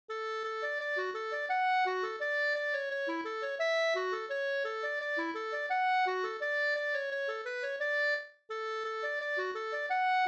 vals; Gunnarskog; Nils Olsson

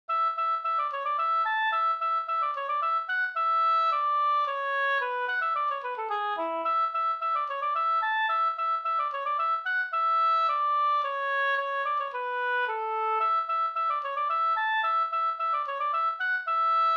slangpolska

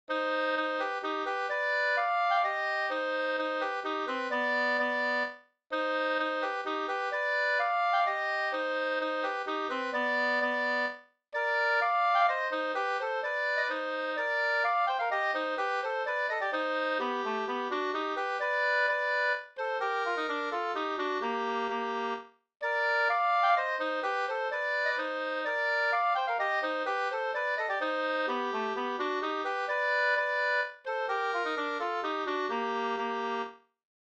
polska slängdans; Dybeck SvTonm

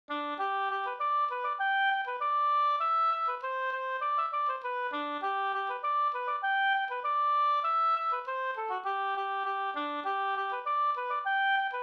engelska; Hagerbo

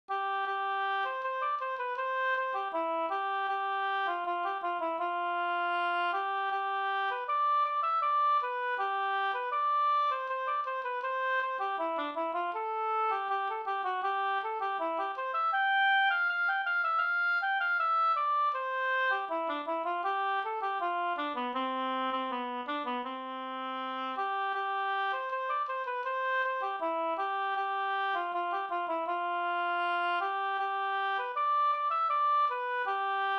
brudmarsch Leksand; Kers Lars

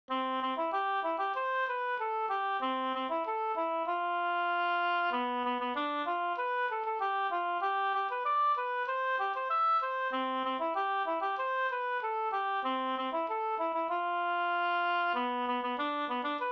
snoa Cdur

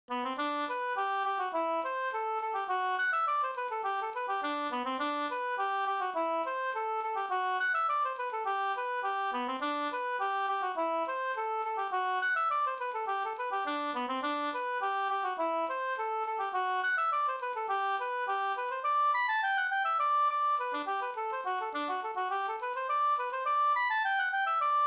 ganglat

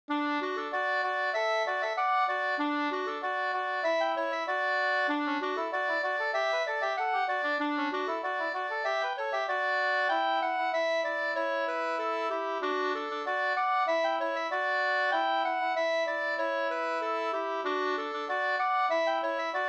anglas; Husby-Oppunda

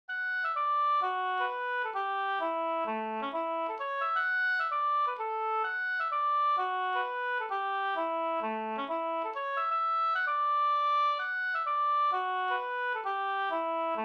hambopolska

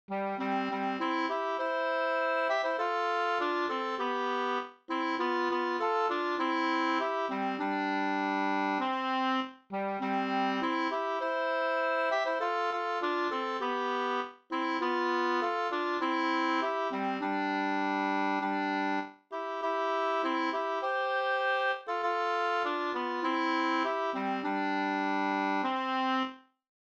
vismelodi; Engsovisan

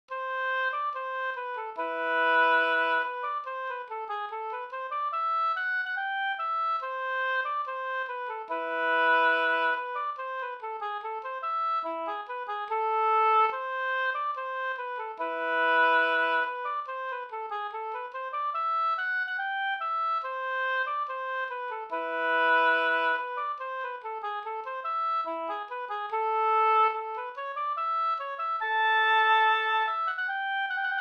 brudmarsch; fr Hassela e Bytt-Lasse

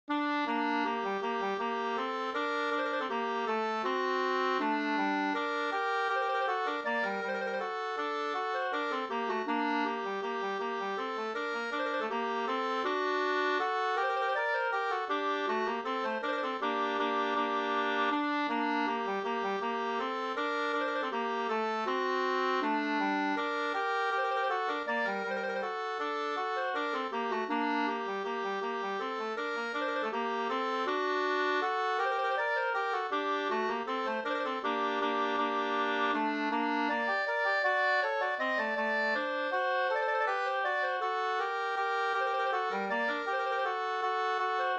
vals g moll

